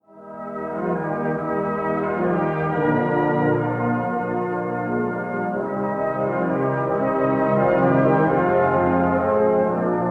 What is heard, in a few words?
Highland Lullaby